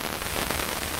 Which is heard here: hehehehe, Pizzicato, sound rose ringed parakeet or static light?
static light